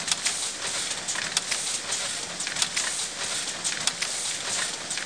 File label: Gear; Sotha